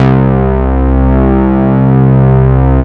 Basses C; Vengeance Samples

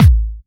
Hard Kick; Vengeance Samples

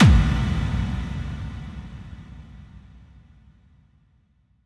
bassdrum reverb FX kick; Vengeance Samples

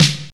Snares & Claps; Vengeance Samples

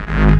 Offbeat Bass G#; Vengeance Samples

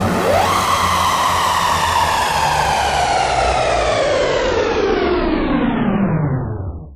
Downlifter

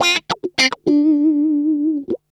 guitars; PS-28 Vocal House Samples

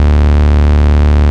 Bass D, Root D

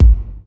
Sub Perc, Bassdrums; Vengeance Samples

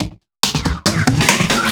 Loops Fills; Vengeance Samples

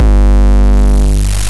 Bassdrums Hard Kicks; Vengeance Samples

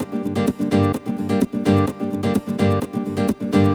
Nylon Guitar G min, 128BPM; Vengeance Samples; VEH3 Nylon Guitar Kit 2

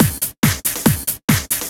Breakbeat; Vengeance Samples; VEC2 Loops

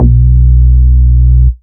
Root B, Ultimate Bass; Vengeance Samples

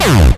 Acid Sounds F#, Synths; Vengeance Samples

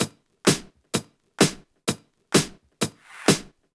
Drumloops 128BPM

